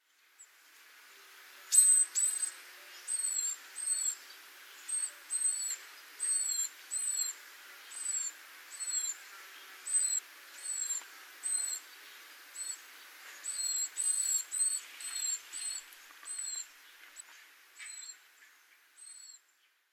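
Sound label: Japanese Tit; saitama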